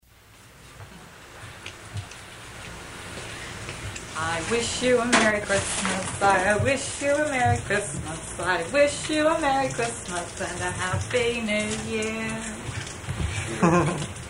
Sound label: VOCAL